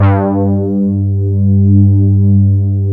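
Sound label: POLY SYNTH G2, SUPER JX; Northstar - New Gold VOL-1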